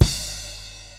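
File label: medium kick; Pro-Rec - Synthsonic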